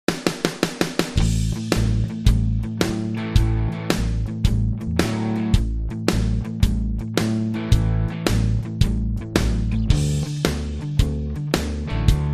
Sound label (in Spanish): RIFF Pista